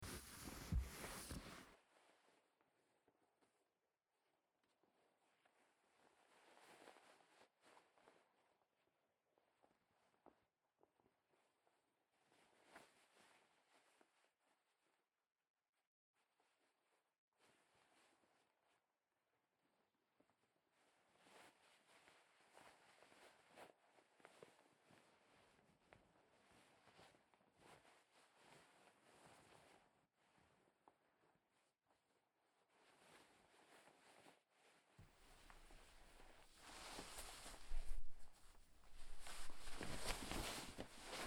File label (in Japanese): 一緒にシャワーを浴びてぇ、髪の毛もみりんが洗ってあげるねぇ ASMR; ASMRver